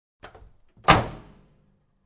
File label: Truck Door Close